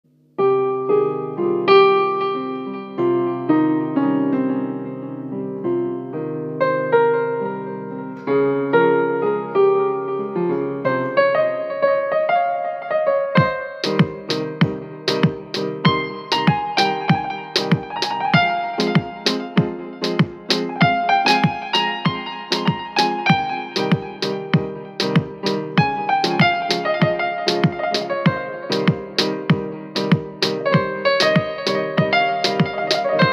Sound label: karaoke minus karaoke music